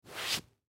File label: Chair Suede Fabric Cushion Wipe Off Single; Household; Blastwave